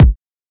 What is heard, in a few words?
old school wet kick drum a key, Royality free kick drum one shot tuned to the A note